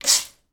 household bleach cleaner single spray onto surface